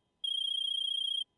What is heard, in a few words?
Cell Ringtone; GTA